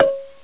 BLOCK

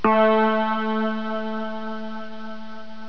DING

